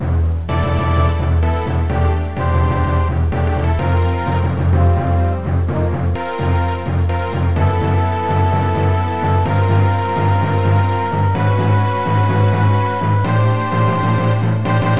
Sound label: Stat Screen; Metroid Fusion US Final